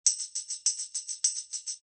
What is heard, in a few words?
tambourine